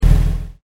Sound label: truck